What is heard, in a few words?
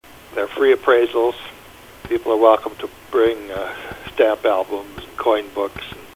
Coin